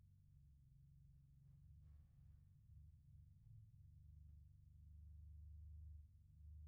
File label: Piano Mono